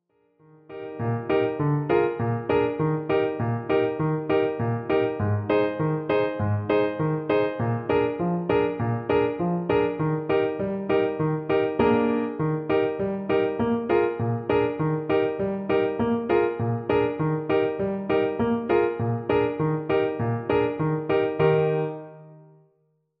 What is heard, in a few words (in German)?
fingerobics es dur